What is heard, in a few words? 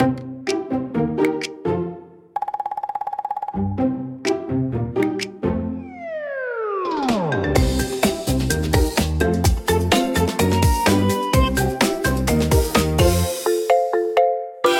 Bouncy Marimba Parade, comical marimba for funny scenes